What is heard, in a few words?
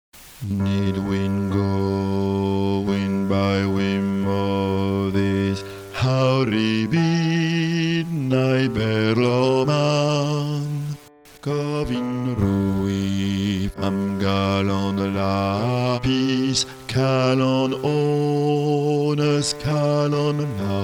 basse; calon lan